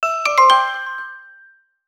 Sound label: Pretty Alert; Sony Ericsson; Xperia X1